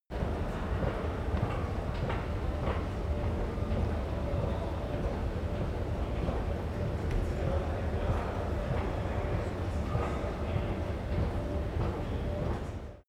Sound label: subway station escalator big; bahnverkehr u bahn